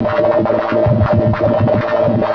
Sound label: alien frantic